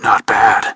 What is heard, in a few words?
cheers; tf